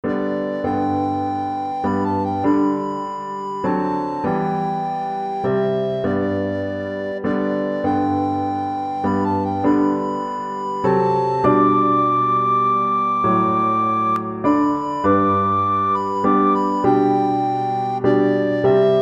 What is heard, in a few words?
amazing grace recorder